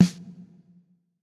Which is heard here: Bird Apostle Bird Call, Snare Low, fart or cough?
Snare Low